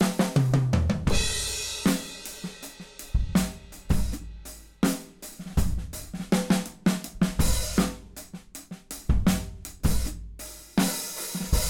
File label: drums